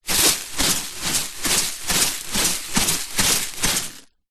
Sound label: rice dry in plastic container shake; zapsplat